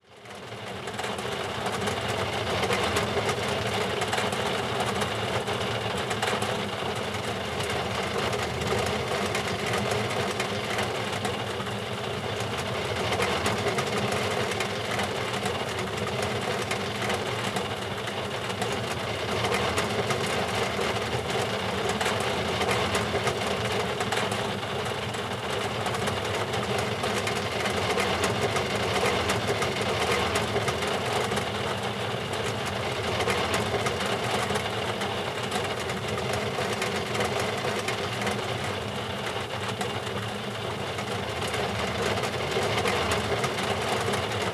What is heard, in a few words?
Rain Sounds